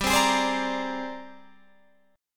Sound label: G sharp Major Sharp 11th G, chord